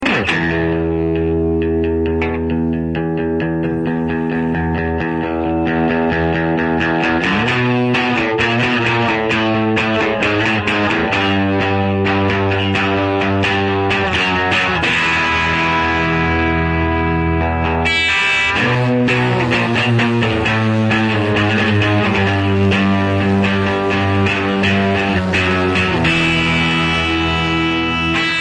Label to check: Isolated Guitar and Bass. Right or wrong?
Right